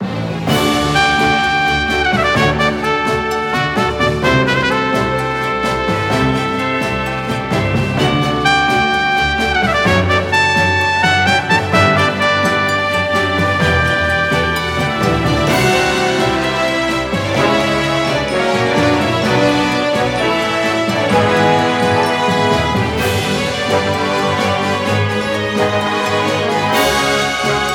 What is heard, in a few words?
Over World Orchestra loop